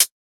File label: hat tight, Closed Hat, Drum One Shots; SD MIDNIGHT Sample Pack; Slate